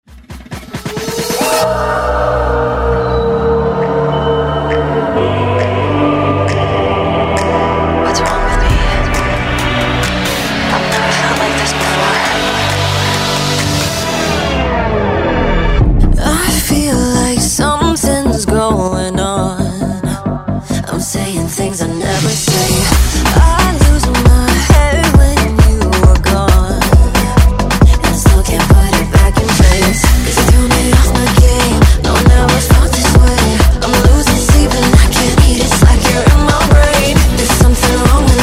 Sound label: Afrobeat Redrum